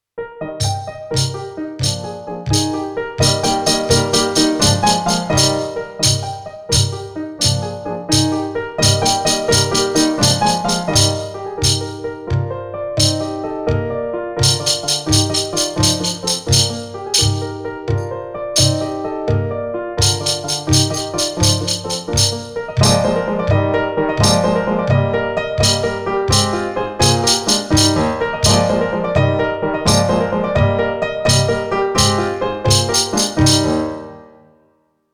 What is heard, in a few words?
Rhythm Exer Dance No1; Ditters